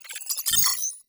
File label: mecha lock